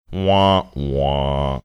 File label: wah wah